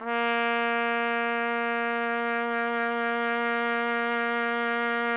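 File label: trumpet; Roland - LA Composer vol. 3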